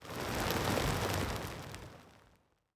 impacts; World of Tanks

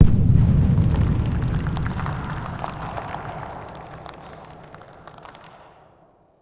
cave occasional

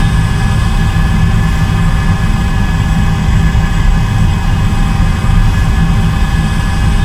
jet cockpit, engines; War Thunder

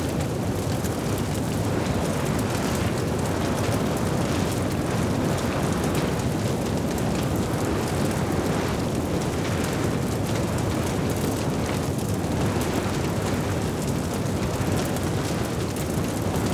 large fire loop; Rust

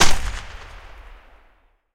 mi35m 23mm gun shot

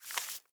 ui pickup paper; Rust

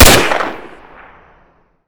Inf Weapons; Red Orchestra 41-45

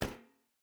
footstep barefoot metal run; Rust

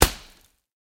bullet dirt; guns; War Thunder

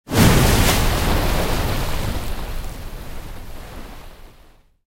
tanks explosion water; explosions; War Thunder

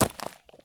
landing slate; Escape from Tarkov

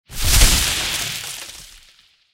tree collision

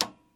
Aircrafts Cockpits Button; DCS

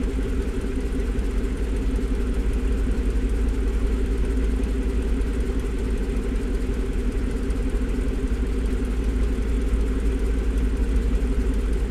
m5a engine rpm 00, engines tanks; War Thunder

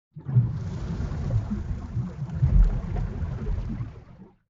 submarines engines; World Of Warships

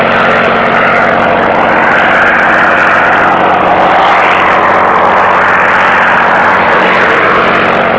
engine bomber rpm far; War Thunder; engines

